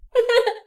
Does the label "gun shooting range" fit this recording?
No